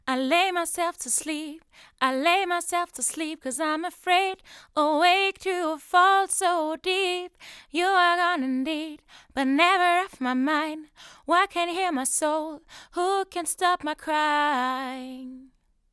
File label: vocals akg perception120